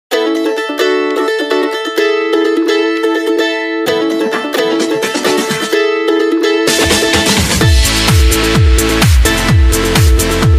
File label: Strum Electro Dubste